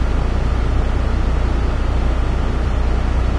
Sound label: industrial loop, ambient tones; Source Engine; cstrike